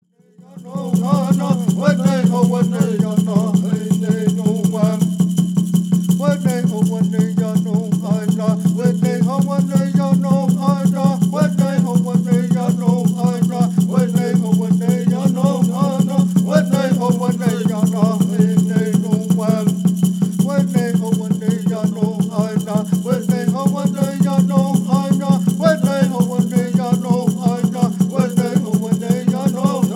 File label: Ceremonial Song